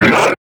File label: attack; Barotrauma; Husk